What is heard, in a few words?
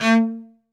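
solo cello, strings; ILIO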